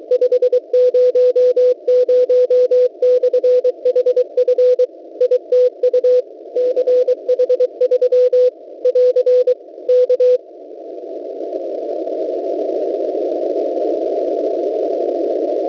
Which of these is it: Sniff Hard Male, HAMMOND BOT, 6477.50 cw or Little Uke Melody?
6477.50 cw